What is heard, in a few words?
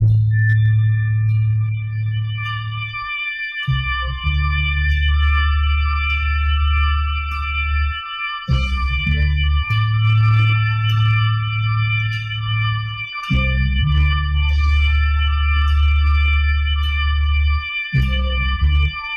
keys, Loops, Sad